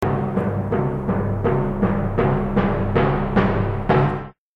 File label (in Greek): Οικογένεια κρουστών